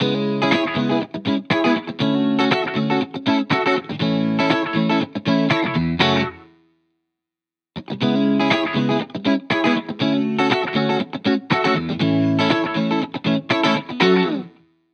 Guitar Bpm120 Key C# minor Full, 70s Guitars Full Mixes; Fox Samples; Back to the 70s Guitars